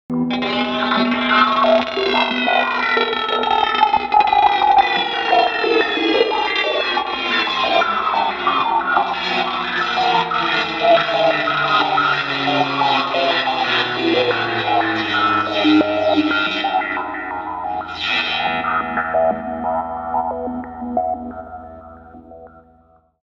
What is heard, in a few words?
Scratchy, spooky guitar sounds; electric